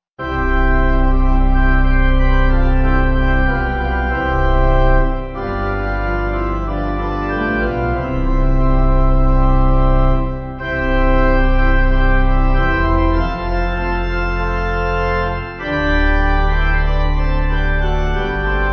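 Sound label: Pipe, Pipe Organ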